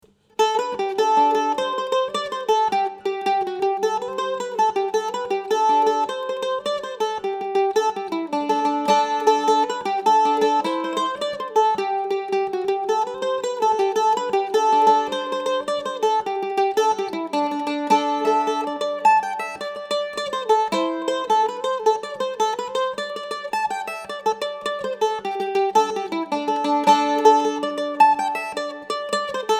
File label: mandolin; Hand carved King William pine top; Hand carved blackwood back, sides and neck; French polished